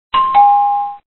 Door Bell

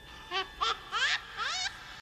Laughing

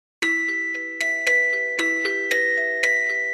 Memory Bells

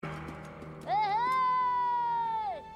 aaaooo; Babu Frik

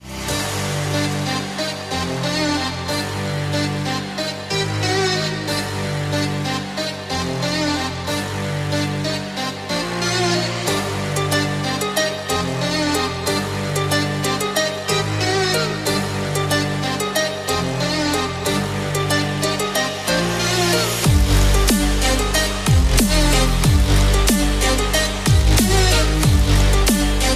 Arabic Ringtone Trap

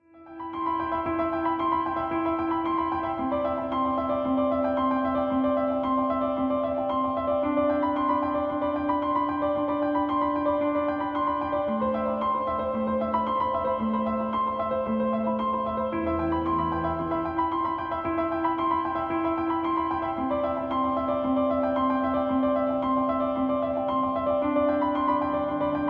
Piano Blissfully

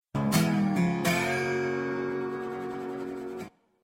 Windows 7 Ring Tone

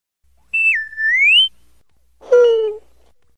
R2D2 Notification

